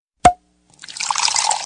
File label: Open Beer